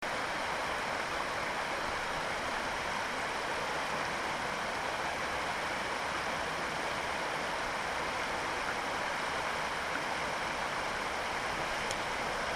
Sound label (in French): Chute d'eau